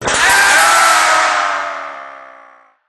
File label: metall fall and screams